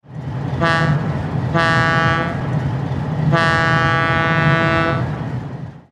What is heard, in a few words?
Leslie A-200; RailPro